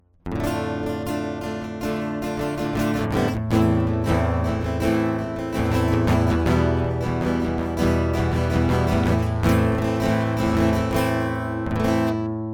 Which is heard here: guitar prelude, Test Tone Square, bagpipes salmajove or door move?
guitar prelude